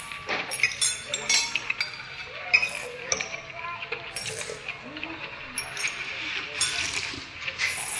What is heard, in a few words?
Ramen Ambience; Slime-san 2017 pc gamerip